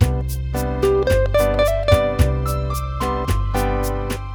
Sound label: bossa, ringtones; Champagne Edition; Google; NewWave Labs